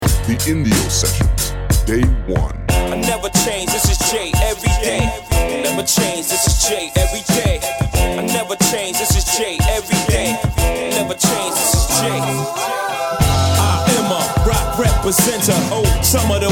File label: Kickdrums Remix